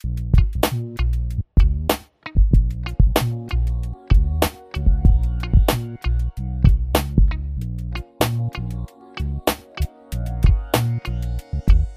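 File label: Lean Hop, 95 bpm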